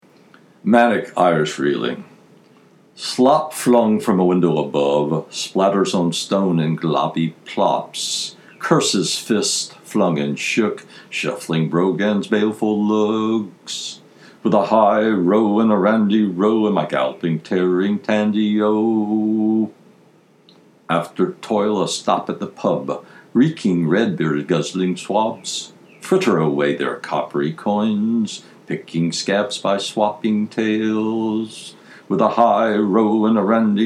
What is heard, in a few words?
manic irish reeling